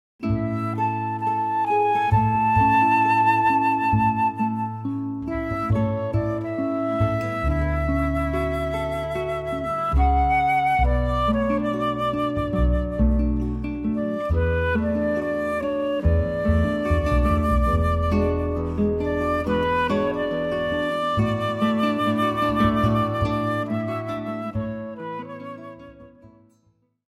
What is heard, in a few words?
guitar flute bass trio